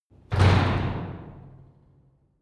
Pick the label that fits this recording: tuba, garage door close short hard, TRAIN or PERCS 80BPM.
garage door close short hard